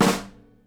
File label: SNARE, Live Snares; E-MU Producer Series Vol. 8 – Platinum Phatt